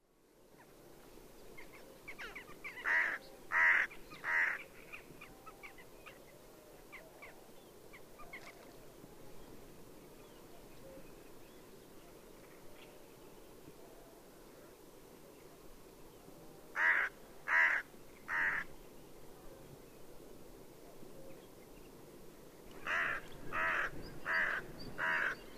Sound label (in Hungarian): dolmanyos varju Corvus cornix